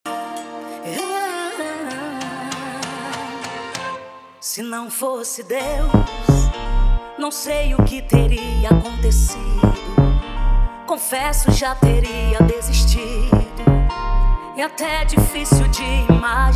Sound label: remix gospel